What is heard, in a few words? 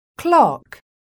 CLOCK